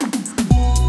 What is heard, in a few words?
Tom Fill, Drums; BB 2HTC Samples Vol 4